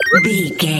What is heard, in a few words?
collect item sparkle pop